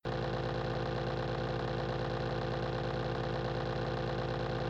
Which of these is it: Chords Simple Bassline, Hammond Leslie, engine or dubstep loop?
engine